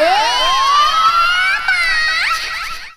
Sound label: vox special